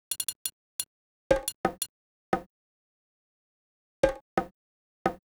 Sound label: Percussion, 088 C#min